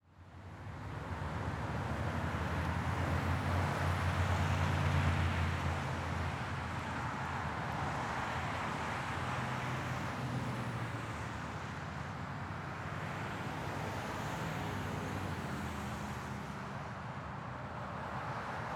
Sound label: Ambience Traffic Busy Road; AdobeCC